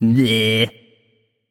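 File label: nonverbal tongue; voicepack; smite; merlin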